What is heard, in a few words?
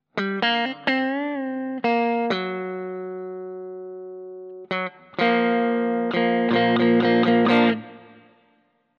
bridge hb neck sc